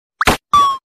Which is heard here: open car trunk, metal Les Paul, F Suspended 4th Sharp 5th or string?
open car trunk